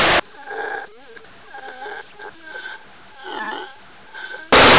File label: zombie grunt idle